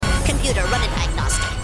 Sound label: computer diagnostic